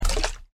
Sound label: splash